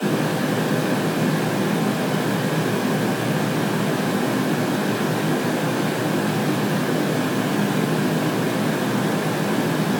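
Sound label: Brown Noise Echo chamber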